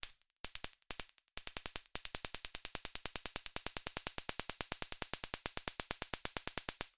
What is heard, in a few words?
warfare taser gun